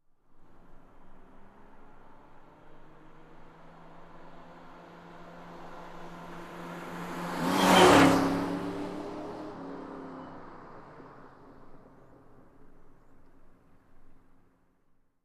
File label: Car driving by fast